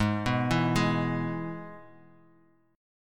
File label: Ab Minor Sharp 5th, Guitar, Half Step Down